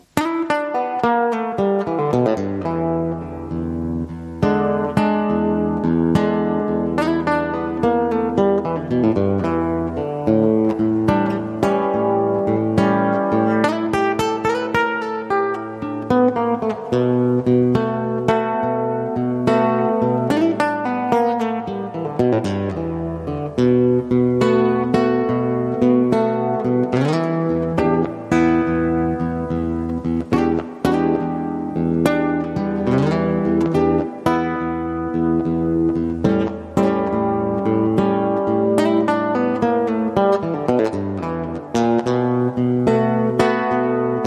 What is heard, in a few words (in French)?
Ma Guitare Blues